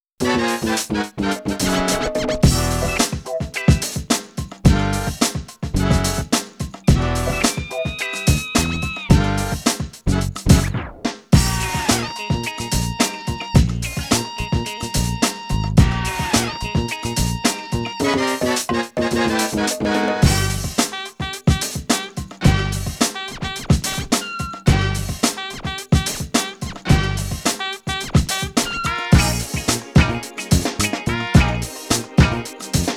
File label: Demo Music - The Funky Element; Time + Space - What's Next!